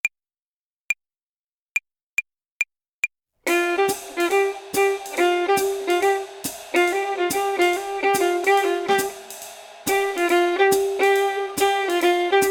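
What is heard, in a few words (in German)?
Swing Phrasierung Übung; play along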